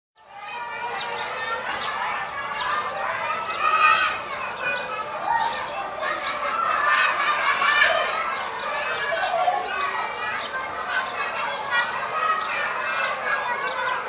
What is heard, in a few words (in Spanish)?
Sonido Recreo Escuela